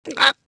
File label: gulp